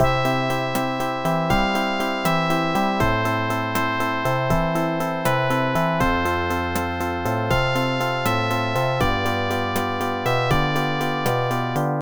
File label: Slow, music synthesizer card demo songs; AdLib; IBM PC XT AT